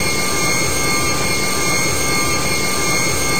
x320 apu; A32X

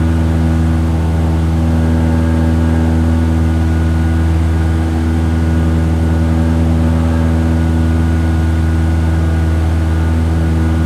engine, c172p

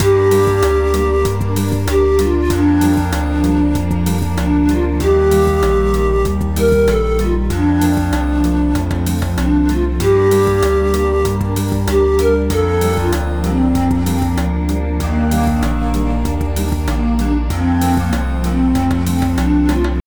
music, announce; A350

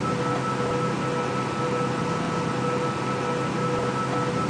A320 cabin; A32X

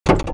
relay APU, Cockpit; A32X

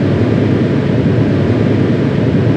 v2500 comb; A32X; wingaft

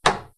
cockpit door unlock; A320

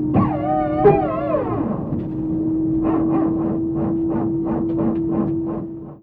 airbus ptu; A32X

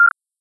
FLARM, glider

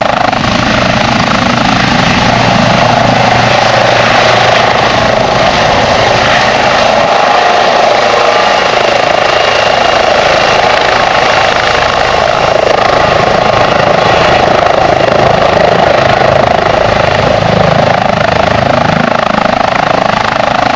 flight ec135 out

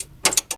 relay, Cockpit; Battery and External Power Relays; A32X

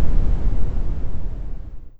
Pack Out; A320; Packs in / out

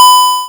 chime, Cockpit; A320

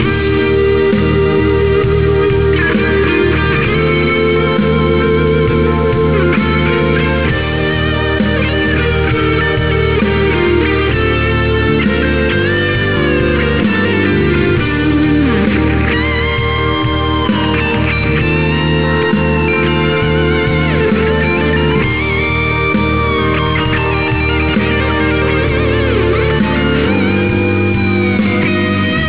music, announce; A350

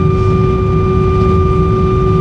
yellow Hyd Pump; A32X

